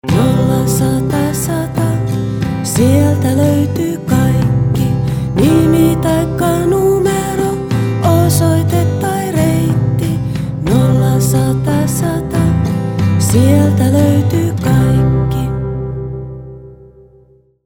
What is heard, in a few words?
Jingle; mainosmusiikki